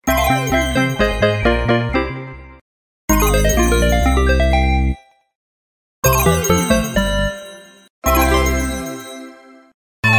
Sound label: win sounds; EGT; Extremely Hot